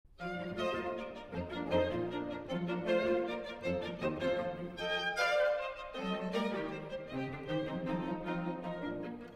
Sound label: String Quartet in B Flat Major, Scherzo Allegro Trio; Op. 18 No. 6